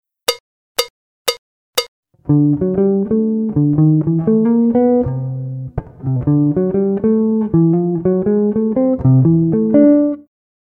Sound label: swing; Jazz Harmonia